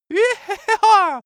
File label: cartoon male cheer